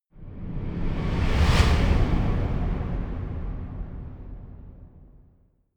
reverby swoosh impact

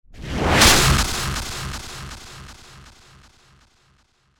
bad slap delay

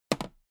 toolbox handle bounce heavy

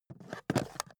charger put down

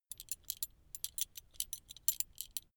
metal belt buckle clatter long soft

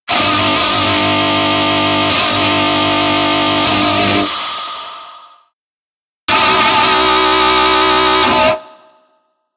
distortion

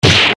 eastern fist hit seq

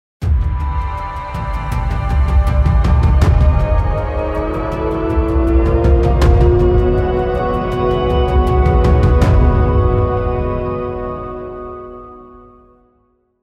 drumming opener

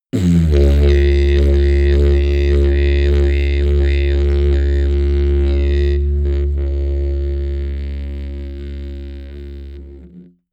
didgeridoo jump slow

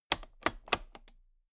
toolbox latch close loose heavy

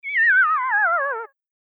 game show spinning

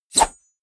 blade whoosh small

